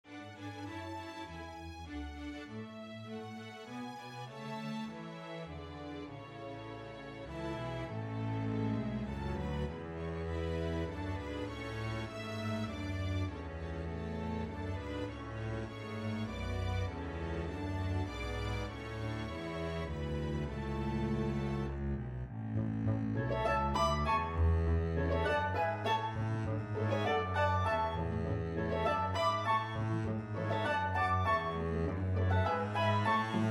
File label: Double Bass Track with Melody; The First Nowell